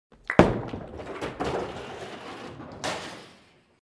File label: bowling miss